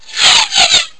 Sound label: arrow whiz